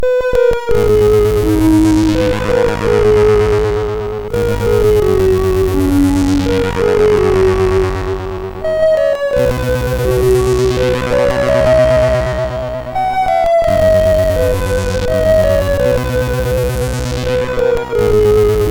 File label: Little Echo, MOS6581; MOS6581R3; hvsc